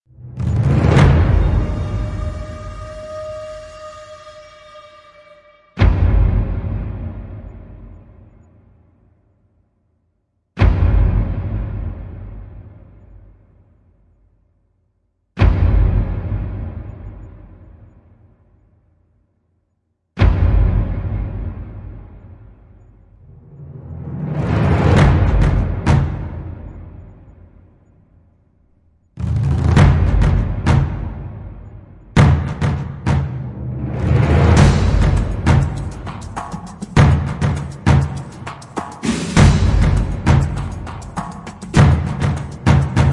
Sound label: Drums Only Version